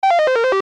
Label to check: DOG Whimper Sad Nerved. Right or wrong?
Wrong